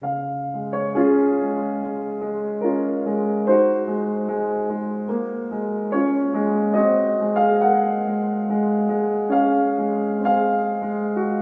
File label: morning calm, alarms; Google; Google Sounds; Classical Harmonies